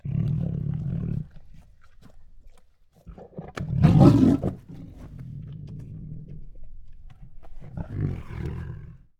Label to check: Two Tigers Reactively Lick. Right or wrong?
Right